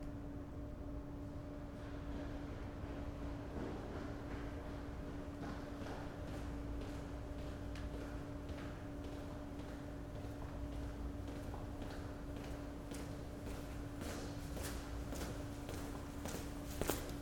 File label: Run Basement